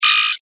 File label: Computer Charging Bleep; Andrew Fleming